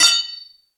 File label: IMPACT Metal Cling Dual Tone; mono; IMPACTS; Universal Sound FX